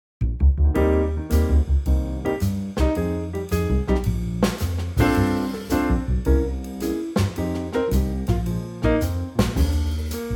Eb trio

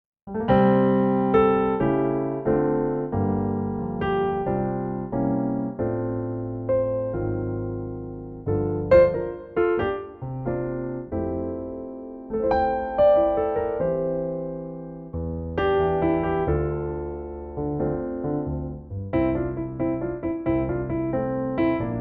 F piano

